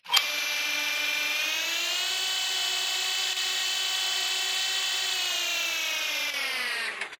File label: Panel Engine Slide Servo; Silent Hill Downpour